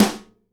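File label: snare nylon damp, Live Snares; E-MU Producer Series Vol. 8 – Platinum Phatt (CD 1)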